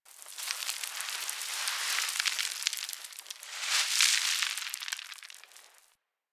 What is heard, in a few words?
Landscape Dust Scatter, Ambience; Warframe; Venus Open World; One Offs